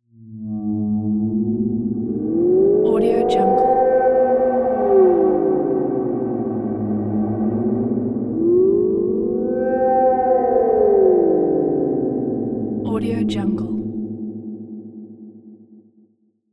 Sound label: cinematic elements swaying drone